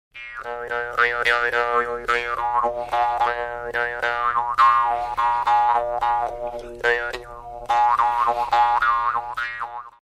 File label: idiophone